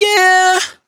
riff Yeah Tuned Ebm, Dry Vocals; Raw Vocals; Slate Digital Omnivox Vocal Sample Pack

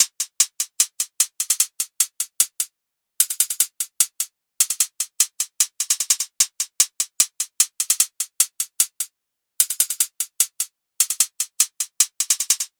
hats 150, Hat Loops; drum sample pack; SEISMIC; Slate

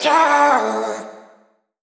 Omnivox oneshot Am, Vocal; One Shots; Slate Digital Omnivox Vocal Sample Pack

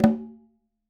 conga Single Hit Flams; Raw Perc One Shots; Slate; SD SEISMIC Drum Sample Pack

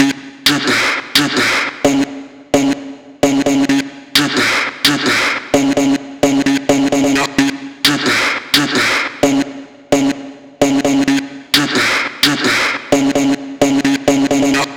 vocal chop, 130, C#m; Drip; Slate Digital Omnivox Vocal Sample Pack; Chops

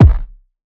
kick degraded, Hip-Hop; Drum One Shots; SD CAICOS Hip-Hop Sample Pack; Slate

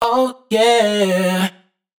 riff Oh Yeah Bbm wet, vocal; Slate Digital Omnivox Vocal Sample Pack

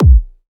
kick townhouse; Drum Sample Pack; One Shots; Slate; SEISMIC

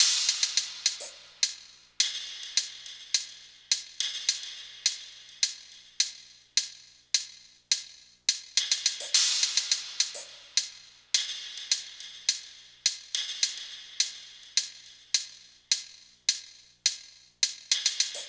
perc, Drum Loops, Top Loops, 105; SD MIDNIGHT Sample Pack; Slate

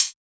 hat breakfast, Closed Hat; Drum One Shots; SD MIDNIGHT Sample Pack; Slate

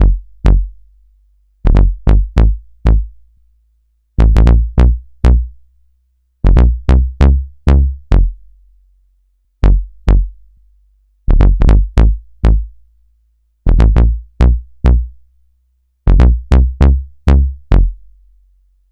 Synth loop 100 G; Synth Bass Loops; Slate Digital BASSHOLE Sample Pack

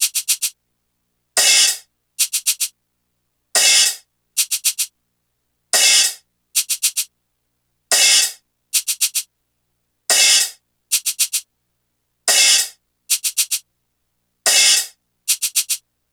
hat 110, Drum Loops, Hat Loops; Celica; Slate; MIDNIGHT Sample Pack

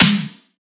snare aggro; Drum One Shots; SD MIDNIGHT Sample Pack; Slate

